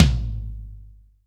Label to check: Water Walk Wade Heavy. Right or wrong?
Wrong